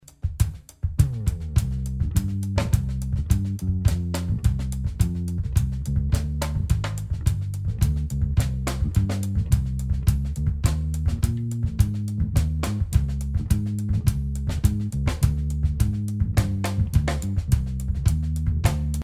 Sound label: samba, bass